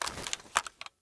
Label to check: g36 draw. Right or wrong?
Right